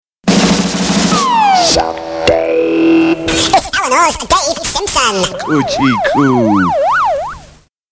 Jingles

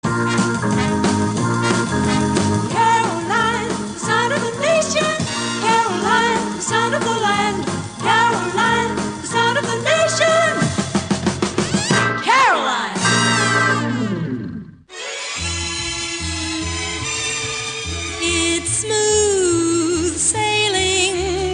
Pirate Radio Jingles; Caroline; London; England; Britain; City

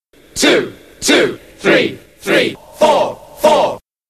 Jingles

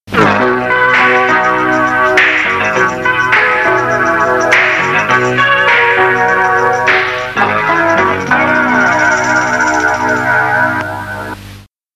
Jingles - Instrumental

